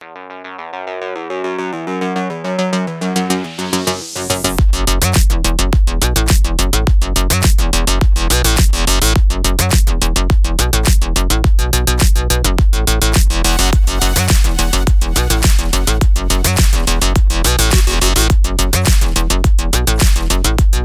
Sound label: dynamic sport electro